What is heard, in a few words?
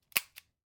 Revolver Trigger Double Action; SoundsCrate